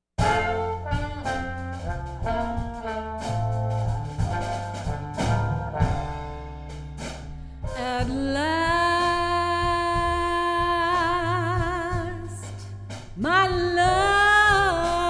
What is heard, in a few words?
Jazz Combo Vocal; Premier